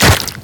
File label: meaty deaths